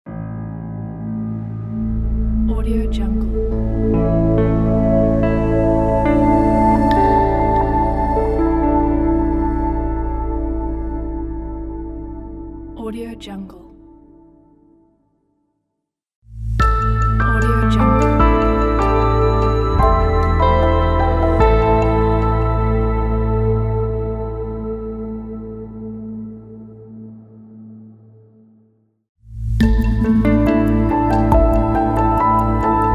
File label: atmospheric ambient logo pack